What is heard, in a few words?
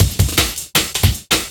Break Beat, Drum N Bass; Sonic Foundry (Sony Creative Software); Knutrix Drum & Bass